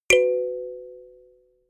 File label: notification kalimba fourth